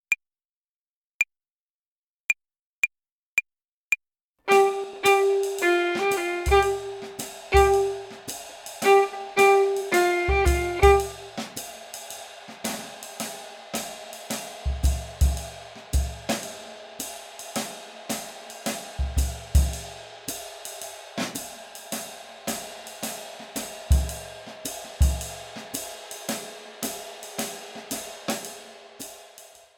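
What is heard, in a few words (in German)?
Swing Phrasierung Übung; play along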